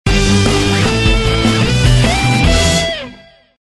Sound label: Complete; Uphill Rush 2; flash online gamerip 2009